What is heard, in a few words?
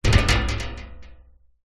foley metal sign loose hit wobble